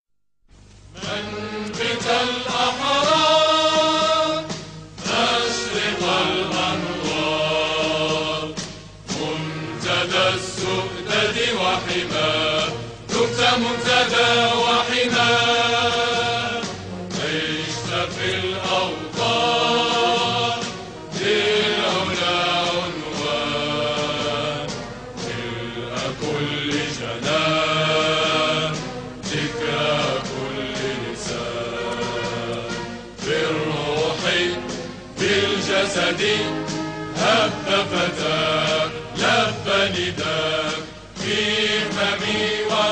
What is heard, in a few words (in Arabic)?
النشيد الوطني المغربي مع الكلمات